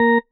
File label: marimba tissue; ゆめ2っき